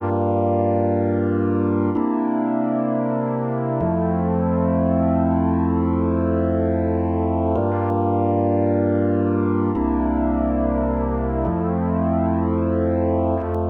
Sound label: CHORD